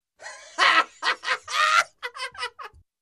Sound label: Man Hilariously Laughing